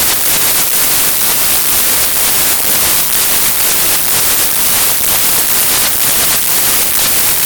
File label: sdr radio centred lowpass resampled